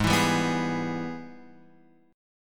Ab Minor Major 7th Sharp 5th, down, Guitar, Open F; strummed; JGuitar